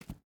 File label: footstep rubber boots concrete jump start; Rust